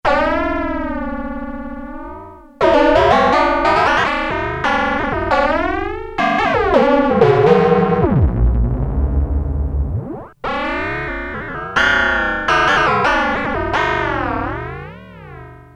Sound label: Detroit Solo, Prophet-5; Sequential Circuits Prophet-5 (Ver.3); Factory Patch; File 3 Programs